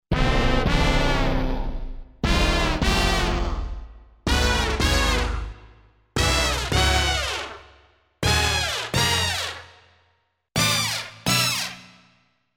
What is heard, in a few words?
Hit Me 2 Times